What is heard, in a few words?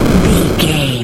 retro explosion general